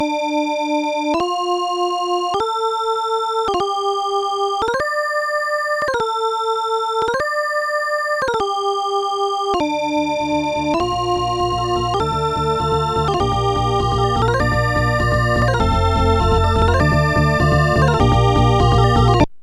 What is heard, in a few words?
jungle meditation ii; MusicLine